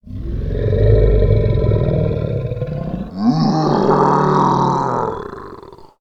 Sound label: Troll Groan